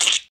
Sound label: endermite hurt; mobs; Mineclonia; mobs mc